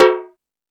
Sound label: Toms 1, drum machine